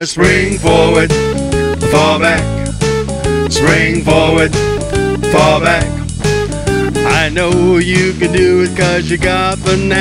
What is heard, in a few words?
Ditty Spring Forward Fall Back; Daylight Saving